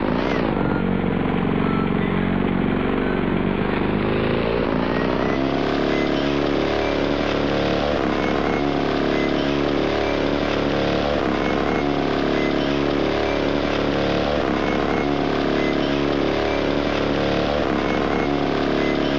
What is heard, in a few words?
jeep; Ultimate Games No. 2; Amiga-CD32-CDTV; last soldier